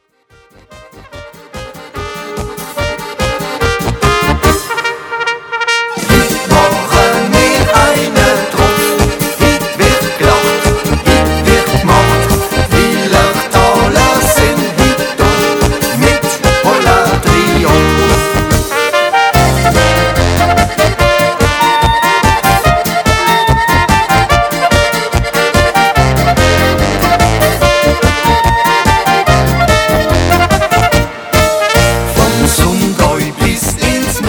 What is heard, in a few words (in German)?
Slowenischer Bauerntanz